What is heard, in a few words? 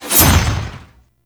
Glaive; Warframe; Ninja